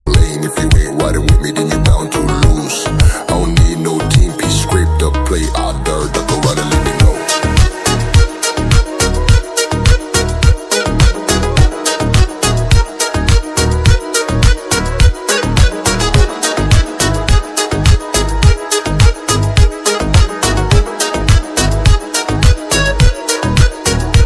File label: tiktok trending music new attitude arabic remix